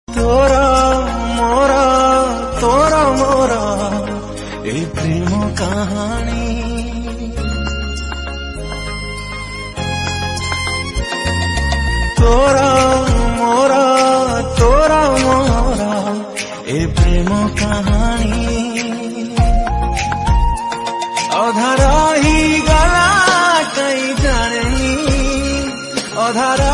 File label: Odia Ringtone